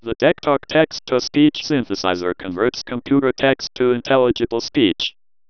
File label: heart